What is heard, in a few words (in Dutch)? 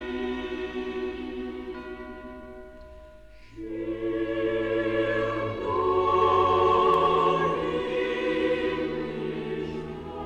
Koor dames met solist vrouw, duits